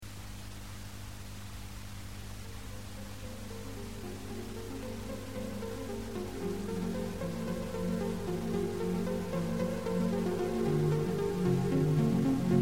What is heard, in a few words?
bee symphony